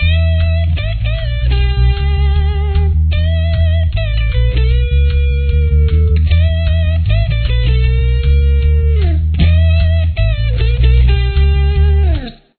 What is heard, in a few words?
solo